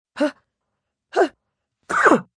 Sneeze Cough, Sneeze, female, anticipated sneeze, partially covered, cold, sick, (human voice); Sneezing; Voice Box